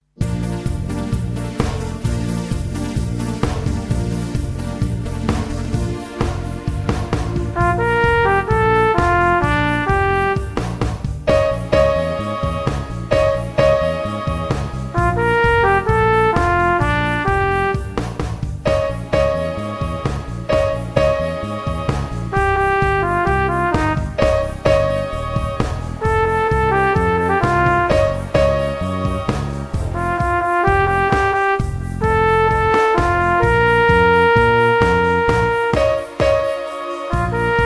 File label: Beat; Nhung chiec xe